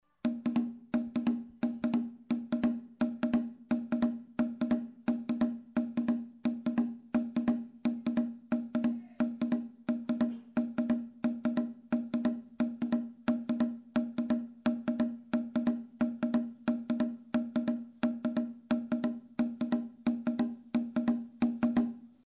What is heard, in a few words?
congo laye deguede